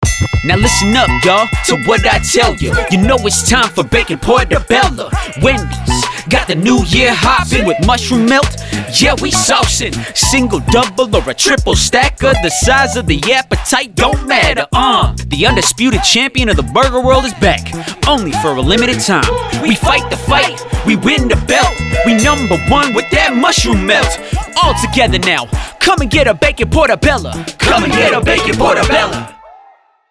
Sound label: Rap